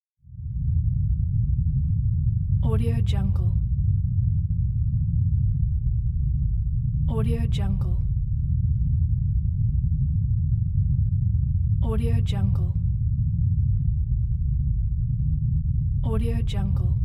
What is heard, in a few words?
space ambient